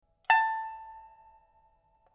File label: pipa; chuYinKouXian